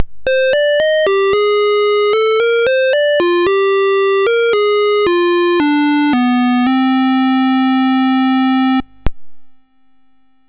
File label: Dragonworld sid MOS6581R4